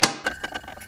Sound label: bomb disarm; snds jack gmod